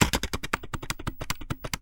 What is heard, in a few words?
Camera Sequence